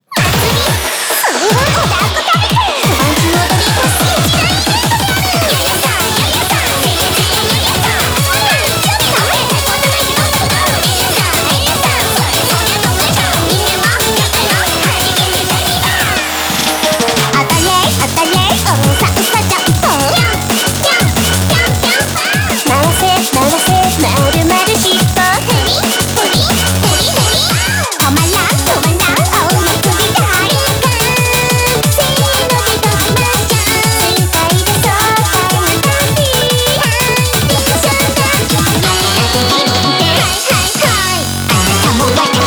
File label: Bgm Reach